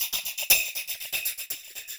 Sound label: TAMB; Zero-G; Chemical Beats